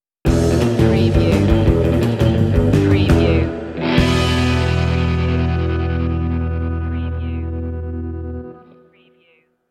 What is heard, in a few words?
stinger